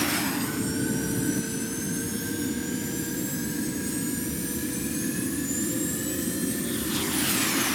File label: Hover Car Loop; android; Does Not Commute